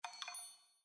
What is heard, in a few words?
Windows Feed Discovered